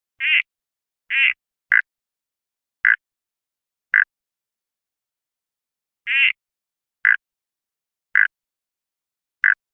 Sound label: Litoria phyllochroa (Cotter River Frog)